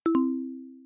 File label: particle Drop; build-an-atom